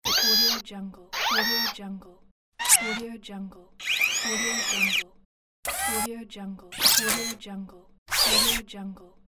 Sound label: robot movements